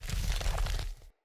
Stone Move Large Stop; Puzzles

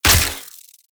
Derelict Prime Shot; Infested; Cernos; Weapons

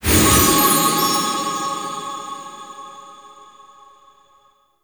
Treasure Found; Endo; Warframe; Pickups

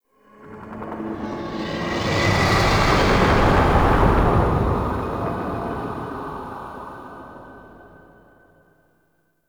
Spectral Whoosh; Warframe; Ambience; Entrati

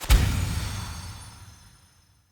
UI Default Conversation Menu Select

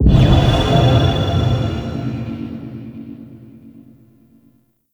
Radial Heal; Warframe; Trinity

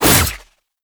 Sickle Whoosh; Warframe; Scaldra Butcher Sickle

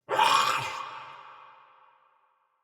pain, soldier; Battle; Mendicant

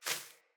Sparrow Quick Move; Animals

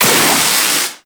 Zap Fire; Trapper

